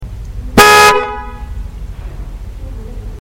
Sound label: X5 Horn Outside